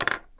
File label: putting a pencil